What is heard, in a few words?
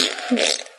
fart short